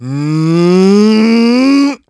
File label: Vox Casting; Riheet; kingsraid